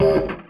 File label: docked, signals; AKAI; FX SIGNALS